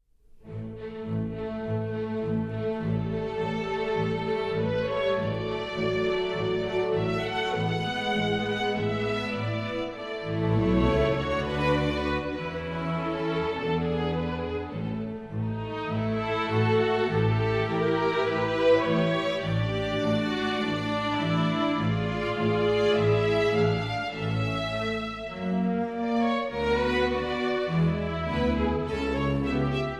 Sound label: Chitarra Concertante Gitarrenkonzert A-du; Giuliani